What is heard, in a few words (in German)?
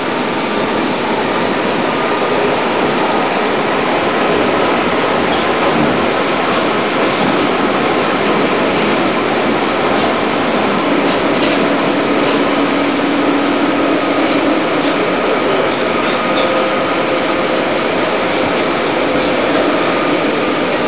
UBAHN